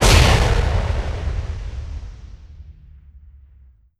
Sci Fi Explosion; SoundsCrate

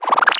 Wobbly Motion Design Riser

